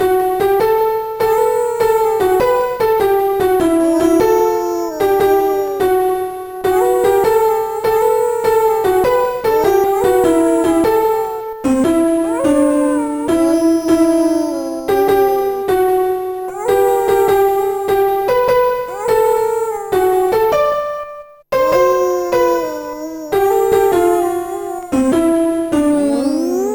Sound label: polish hymn; Trackers; Modland; Raster